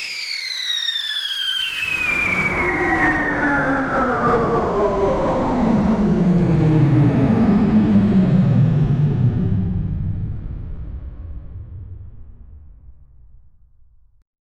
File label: futuristic spacecraft lan, ambiance